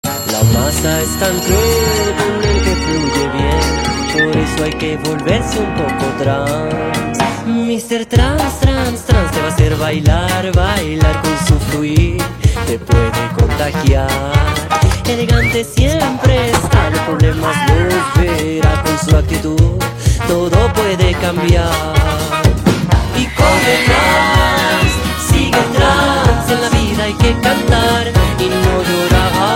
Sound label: trance